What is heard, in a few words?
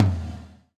GATE TOM, Drums Acoustic